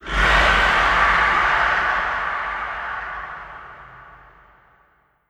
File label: antlion distant scream; creatures